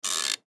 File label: Arcade Shooting, Arcade Shooting Game Antique Load Gun Spin; Technology; Blastwave